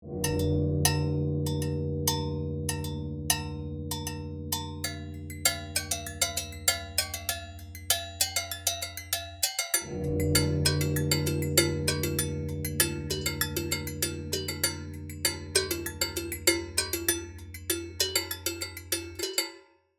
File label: Bells, tuned percussion